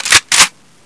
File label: ak47 bolt pull